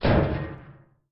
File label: Ship Turbulence